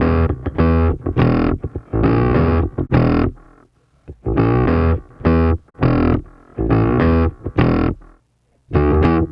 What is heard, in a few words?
Bass